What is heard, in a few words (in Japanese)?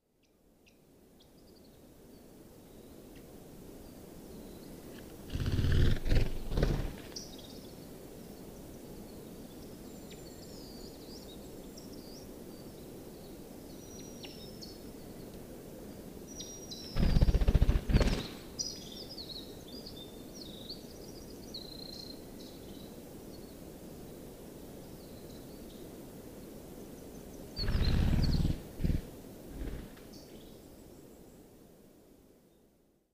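ezoraicho, hazel grouse; furano; koe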